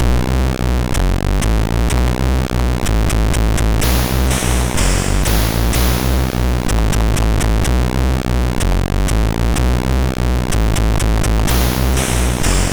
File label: Stormy Night, sid MOS6581R3